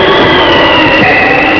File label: Hurricane